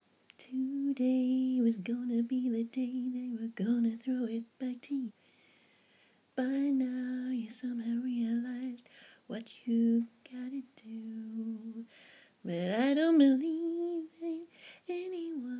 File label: [F][singing]